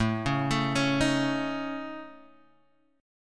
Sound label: A Major Flat 5th, Guitar, Half Step Down